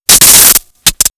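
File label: Electri City, electronic fx; X-Static Goldmine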